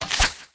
pick card

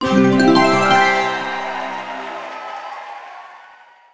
win game

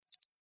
line win

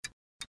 tic toc

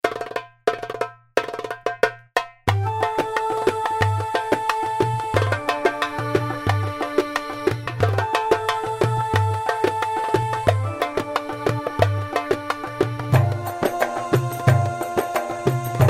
bg lobby

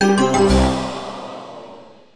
lose game

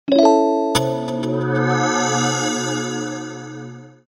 result big win

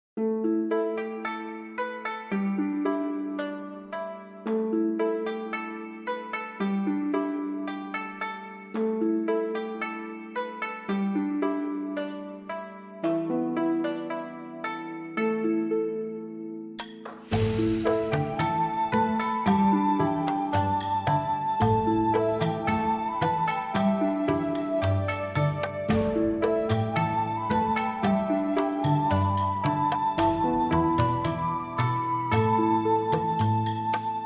background; cotuong

